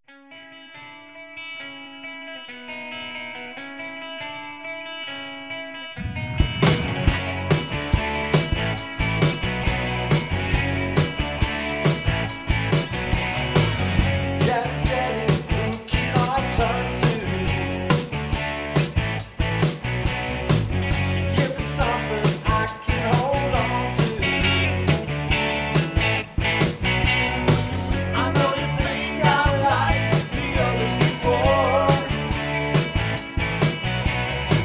Cover Band; Omaha

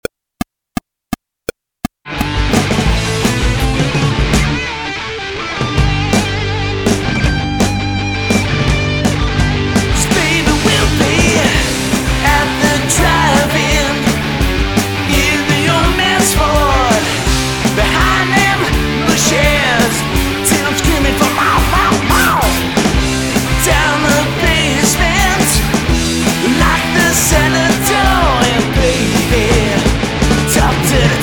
80s Band

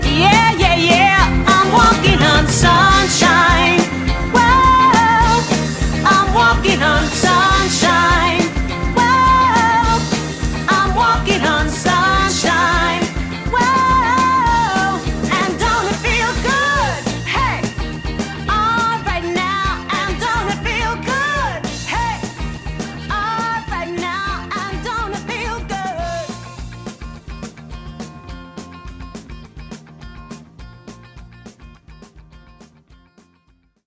80s Band

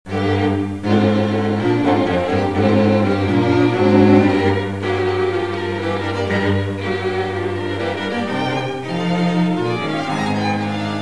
String Quartet

